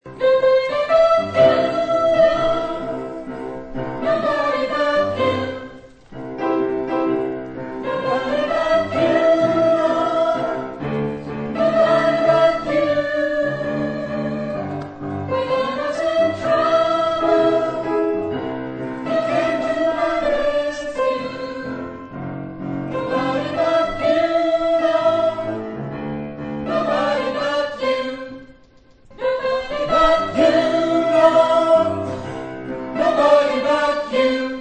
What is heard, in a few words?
tenor